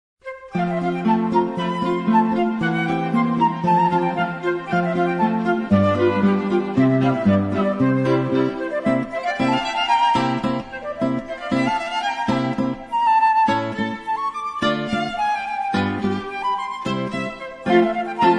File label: scherzo trio